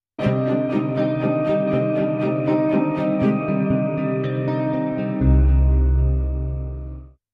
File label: Iwato & Hirajoshi